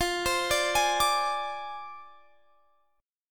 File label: F Minor 6th F down Guitar Standard; Fm6; arpeggiated; JGuitar